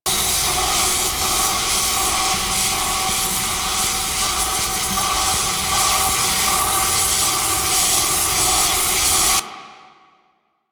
Steam Exhaust Loop A; Warframe; Ambience; Grineer; Grn Four Way Connector 1

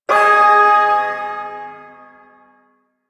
Shawzin Chromatic Chord; F; Warframe; Duviri Ersatz Shawzin

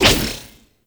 Necramech Melee Thrust; Enemies; Warframe

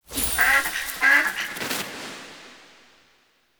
Duck Mote; UI; Animal Motes

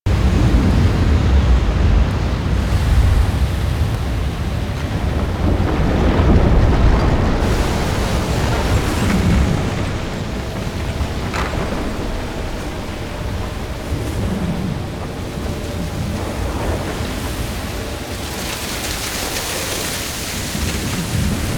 Exterior Foreground Desert Angry Jealous Loop; Ambience; Duviri; Warframe

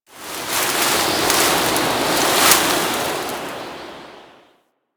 Ocean Rain Gust; Warframe; Grineer Ocean

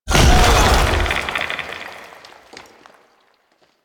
Half Life Impact; Warframe; Qorvex

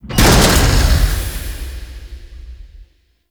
Galleon Platform Stop; Ambience; Warframe; Grineer Galleon; Vor Galleon Fight

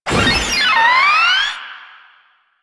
Proto Infested Maggot Attack, Enemies, Vocals

